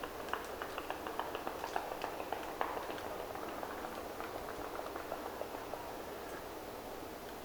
laulujoutsen juoksee veden paalla

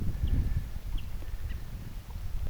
punakylkirastaan ääni; Saaressa oli yhä punakylkirastas.

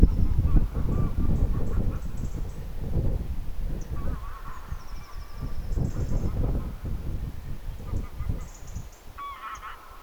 koiras kotihanhi huutaa niin, että maisema raikaa

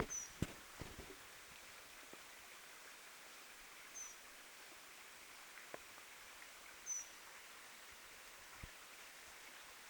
rautiainen vai punarinta

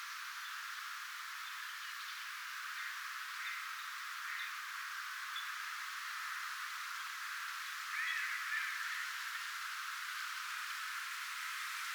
olisiko jotain isokapylintulintuja sinisorsia

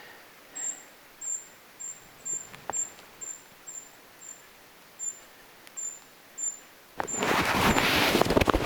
tuollainen puukiipijalintu saaressa

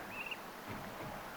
tuollainen tyllilinnun aani

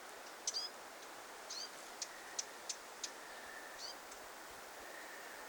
hernekertun poikasia

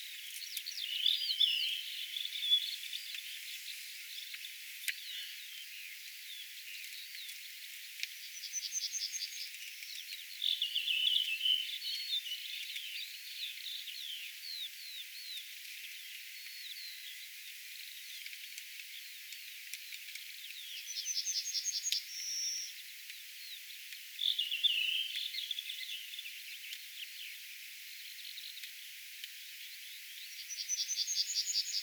tuolla tavoin laulava punakylkirastas

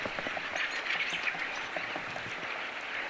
mika rastaslaji

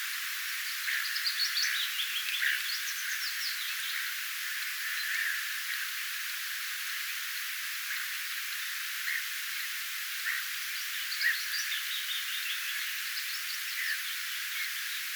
pajulintujen tuollaisia laulutyyppeja lopussa ilm pajulinnun sita korkeata aantelya hiukan; Pikkutylli soidinsi siellä lokkien pesupaikalla

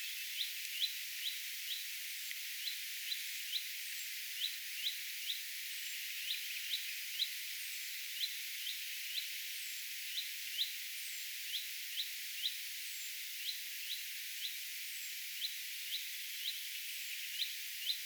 peippo aantelee kuin sarjassa; peippo ääntelee kuin sarjatulta

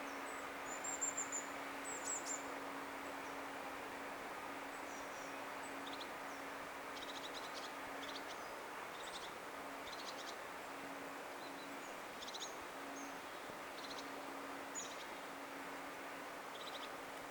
nuoria toyhtotiaisia

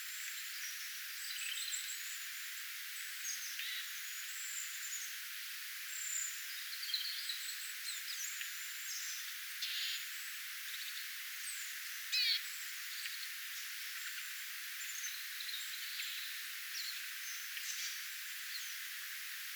tuolla tavoin laulava ilm punarintalintu

